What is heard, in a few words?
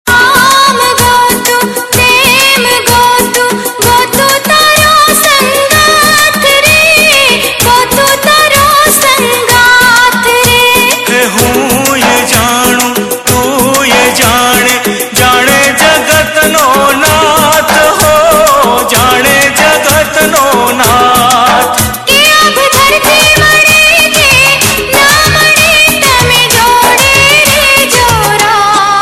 Dandiya